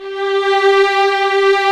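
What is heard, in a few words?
strings, 8pc; String Master Series; Vlns 2-5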